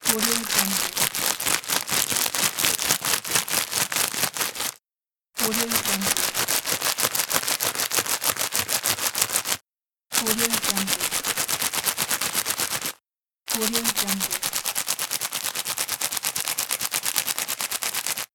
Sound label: the packaging